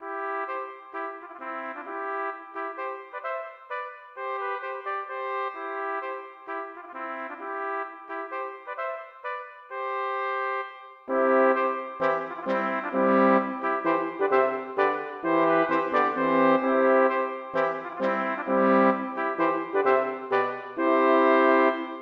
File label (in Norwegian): Menuet for messingkvartett; Aamodt